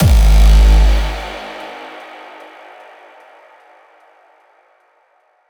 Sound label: HS RKick 150; Industrial Strength; Gancher and Ruin Drumshot Ammo; Hardstyle&Rawstyle; 鼓声